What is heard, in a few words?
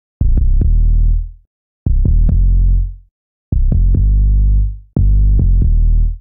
Bouncy (Cmin) 144bpm Bass Intro; Ableton; Factory Packs; Samplification